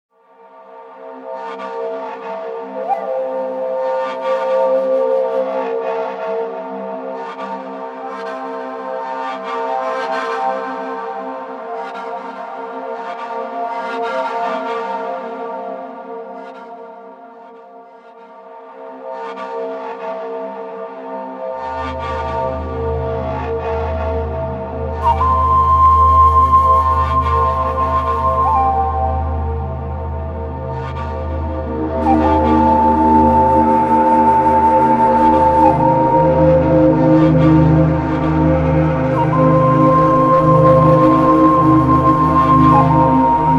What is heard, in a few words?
wild africa ambiance